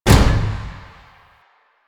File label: Service Door Close; Con Junction; Warframe; Ambience; Entrati